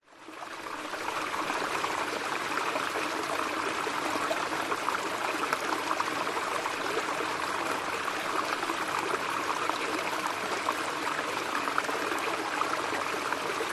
waterfall cascading water flow trickle medium wide; nature